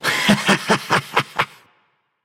laugh; smite; thoth

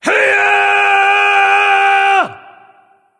battle cry; smite; odin

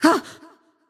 notice; smite; voicepack; medusa

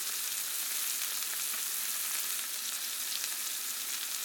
burning; smite; zhongkui

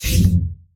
idle stroke; smite; god merlin

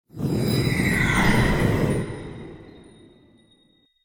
intro magic; smite; chiron

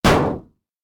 bulk metal hit; Smite; Cabrakan

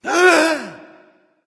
breath; smite; ahmuzencab

